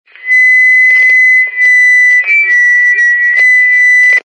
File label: walkie talkie feedback steady